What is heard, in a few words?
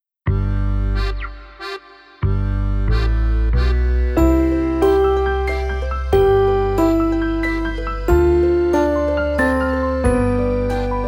Ferris Wheel Slow